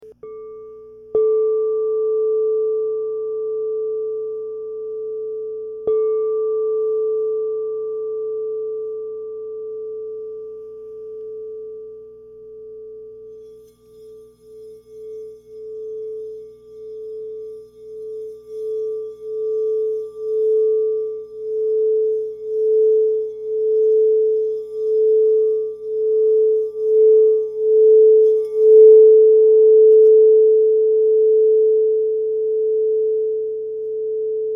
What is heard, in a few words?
Crystal Tones Singing Bowls